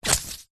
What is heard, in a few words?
cartoon squash deflate; zapsplat